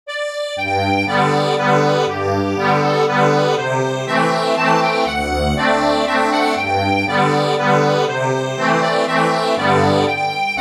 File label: Waltz accordion; Spanish